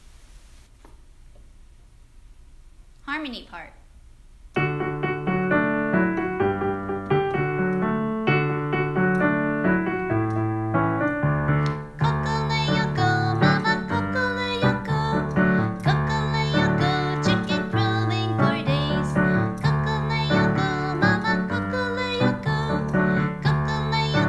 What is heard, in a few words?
harmony